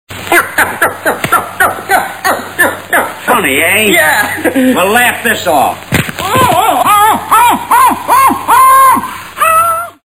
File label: funny